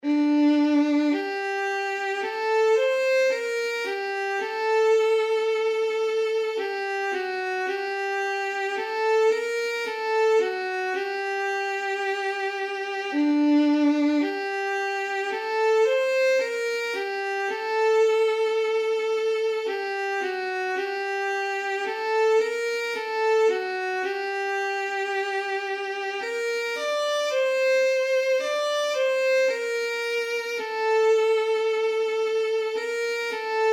sopran midi; Boska dobroci

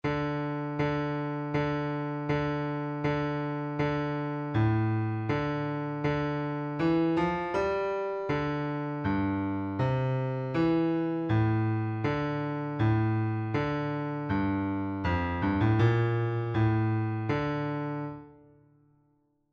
hejnał bas midi

